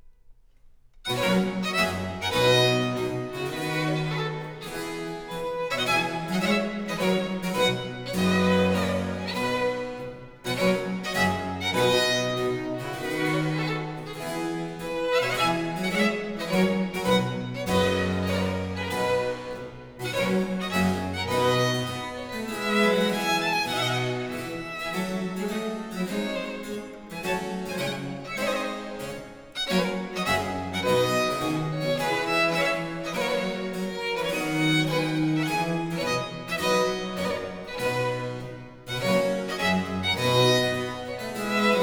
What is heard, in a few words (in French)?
Pièces de violes, Suite in C Minor; Book 3, No. 23-32; Nicola Matteis; Ayres for the Violin; Quatrième Livre (Paris 1717)